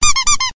pishalka sms

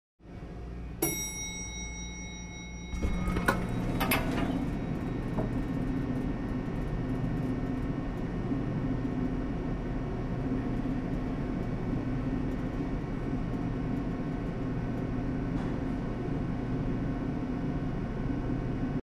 zvuk otrkrytiya i zakrytiya dverey lifta

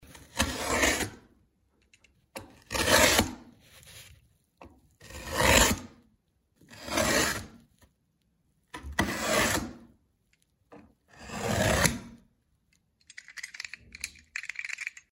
rezhut karton korobku kanc nojom

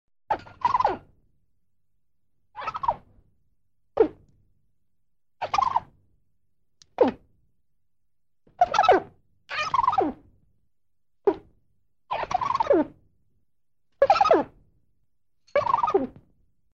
belka; звуки белок

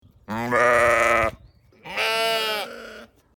baran i ovca golos; Звуки, которые издают овцы и бараны; Звуки, которые издают овцы и бараны в нескольких вариантах

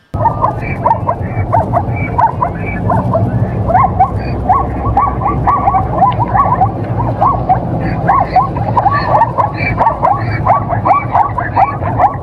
okapi

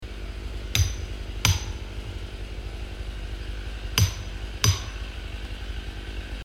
benzpila

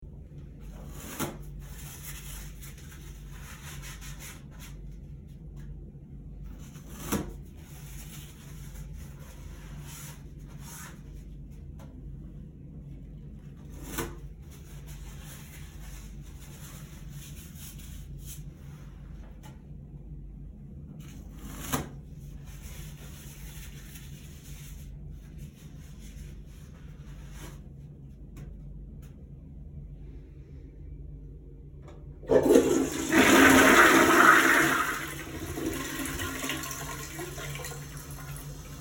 tualetn bumaga

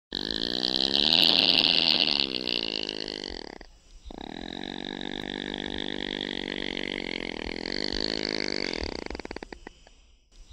opossuma

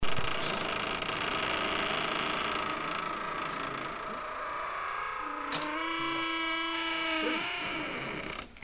Strashnuy skrip dveri

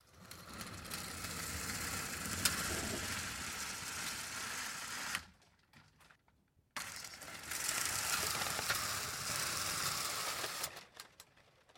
zvuk zhaliuzi

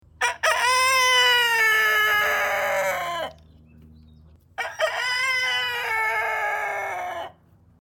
kukareku

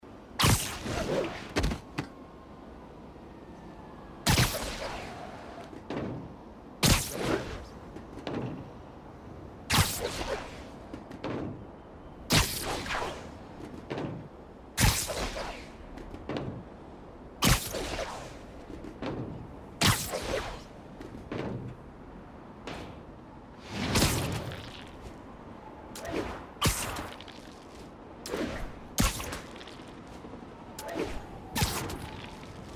letit na pautine po gorodu; spidermen

